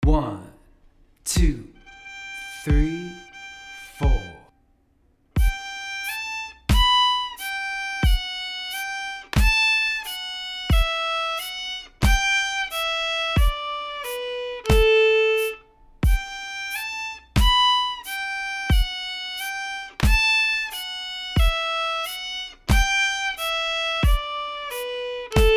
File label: exercise, fiddle; georgia shuffle; blackberry; old time appalachian fiddle lessons